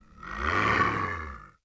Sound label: WARN